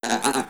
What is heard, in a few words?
pain